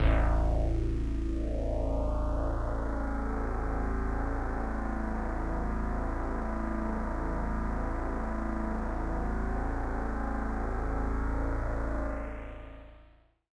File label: One Shot Drone A, dystopian drone; One-Shot Drones